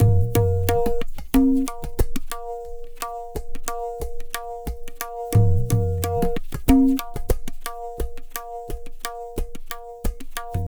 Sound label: Pure Tabla, 090BPM; Zero-G